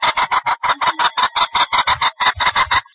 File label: Phasianus colchicus; Chordata; LandBirds